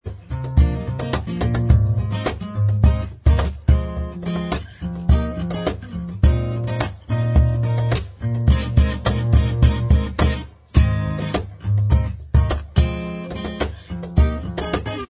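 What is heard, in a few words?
acoustic style instrumental track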